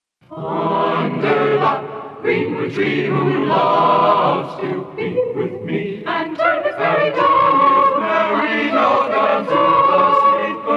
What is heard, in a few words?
Chorale